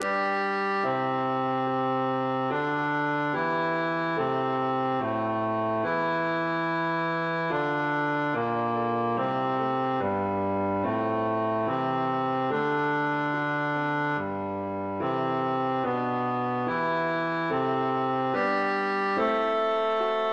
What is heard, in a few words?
bass